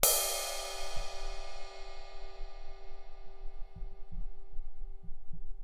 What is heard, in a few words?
Ride, Cymbals